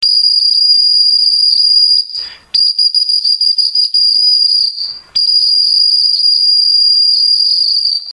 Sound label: dog